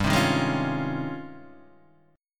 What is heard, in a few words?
G sharp Major 13th